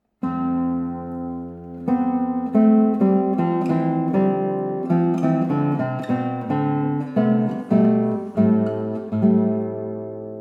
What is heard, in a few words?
levantine suite cantilena